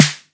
loud snare sound D key, snare drum sample tuned to the D note; snare drum samples